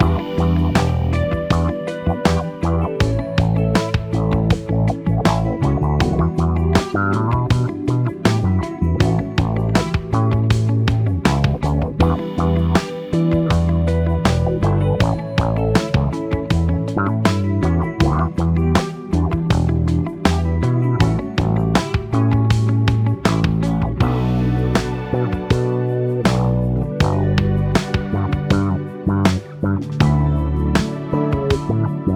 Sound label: Funk Slow Down; Funk Music Pack